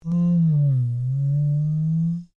Created Creature Large Exclamation, Alien Sound Effects; Created creatures, vocalisations and pretend speech